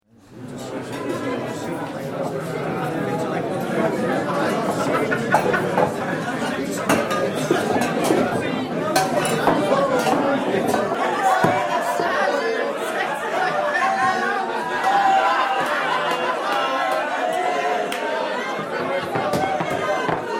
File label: Pub Crowd Lively no modern sounds; drunken crowd and singing - good for 1840s; Bars And Club; Tom Hutchings